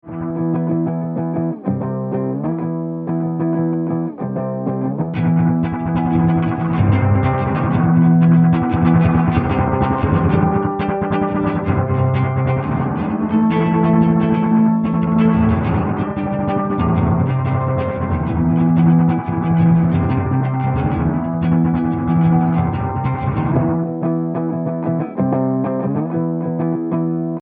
rock; ghostdelay

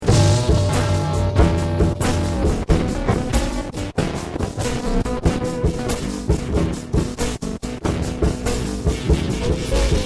drum blues; deathbyaudio

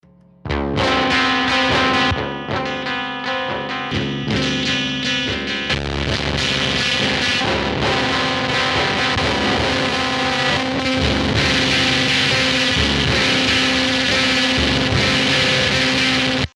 plastic, waveformer; deathbyaudio

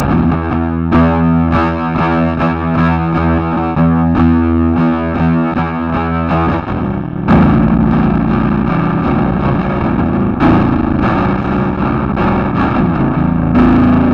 octave clang dischord; deathbyaudio

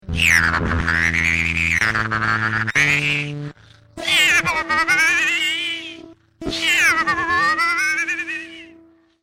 whistler; deathbyaudio

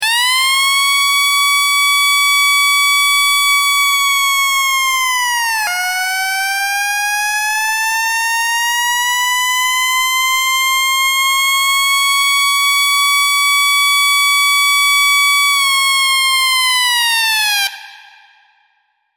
french toast siren; deathbyaudio

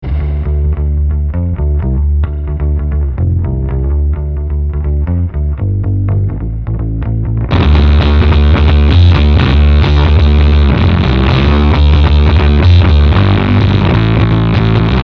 funky; apocalypse

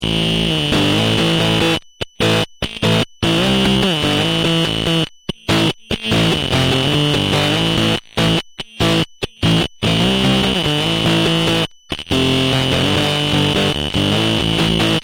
wicked bass fuzz; deathbyaudio

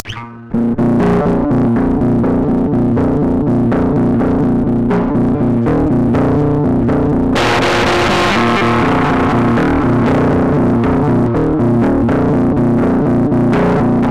octave clang crash; deathbyaudio

